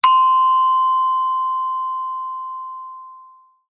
musical instrument gender small gamelan single mallet hit high